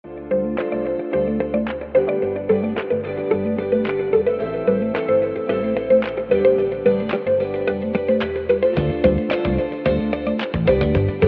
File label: instrumental